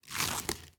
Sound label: bow back; smite; medusa